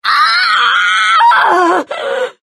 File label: death; JK2; TAVION